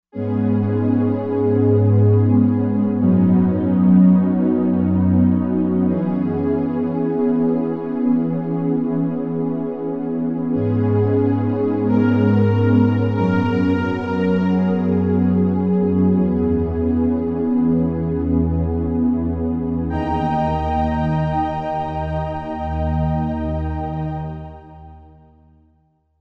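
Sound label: Pad Soft String, synth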